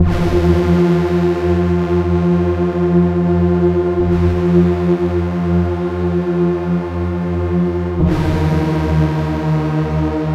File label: Pad, Trance